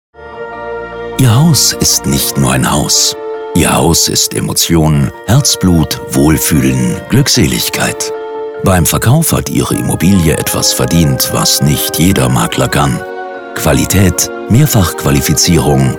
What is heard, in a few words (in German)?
Wohlige Emotionen Musik